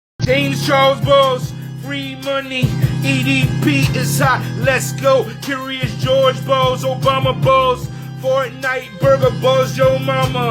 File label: Rap